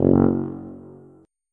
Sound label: tuba note